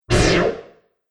Robot Chunky Laser; Robot Sound Effects; Sci-Fi Robot Sfx; Andrew Fleming; Twistedtracks

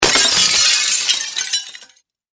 Impact Glass Break Large Smash; Materials

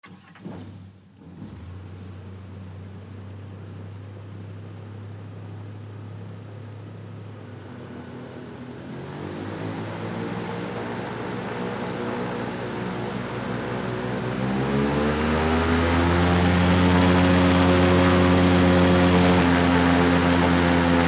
Air Boat Start Drive Around Shut Off; Transportation Watercraft; Boat Sound Effects; Boats (Sound Effects:Vehicles); Blastwave

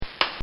Blacksmith Nailing A Horseshoe On; Bobby Cole

